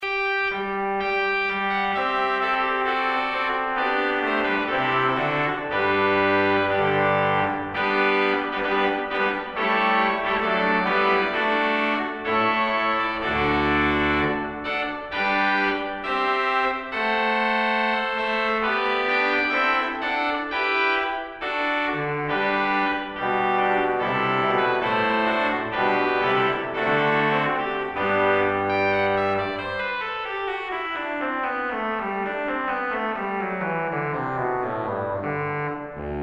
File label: festival trumpet